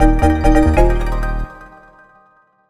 Home Menu Banner; Picross e collection 2011-2018

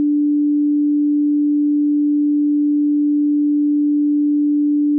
Panel Nutrient Water; Among Us

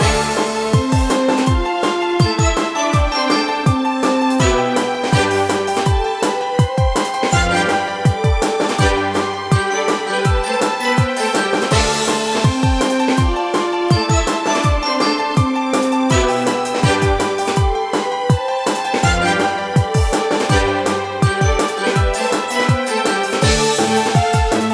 Orchestral Boucle; ener g dance squad imagine modern dancer 2008 nintendo ds gamerip

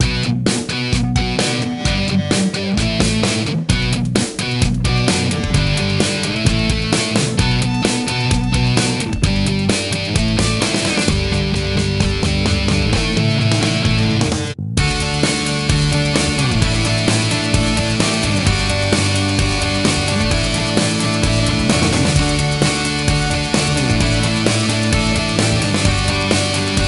Band No Vocals; Fictional Band 1

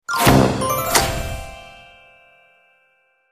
Unlock Sandbox; bad piggies; android; mobile; windows

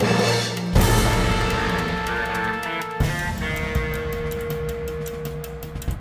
Action END

